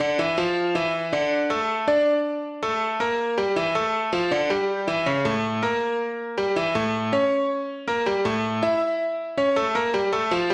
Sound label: Bach Piano Concerto No.1